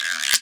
Yamaha CS6, Drums

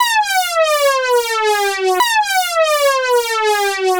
SFX, Trance NRG

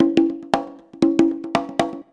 CONGA 119, Modern Percussion Loops

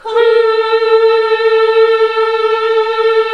HMM, Nuns; Sound & Vision - Gigapack II

